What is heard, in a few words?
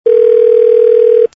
Phone Ring Internal; AIM6